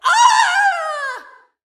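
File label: inmate female knockout; Prison Architect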